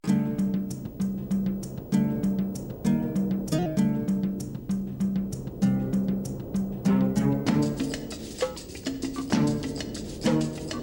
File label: desert; PhoenixCraft-1.16.5; ambience music